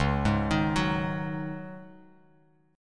Db-Suspended 2nd Suspended 4th-Db-down-Bass Standard; arpeggiated; JGuitar